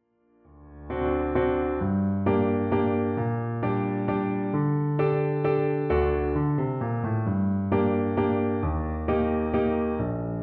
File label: Waltz